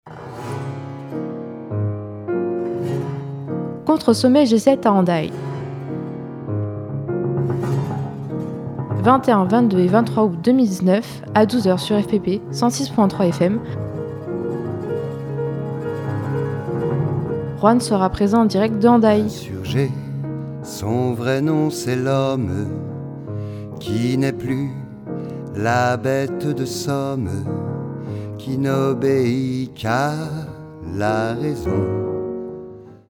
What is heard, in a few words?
radio jingle; sommet alternatif au pays basque